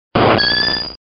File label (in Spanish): Grito; Yanma